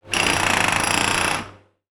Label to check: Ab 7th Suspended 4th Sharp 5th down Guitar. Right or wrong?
Wrong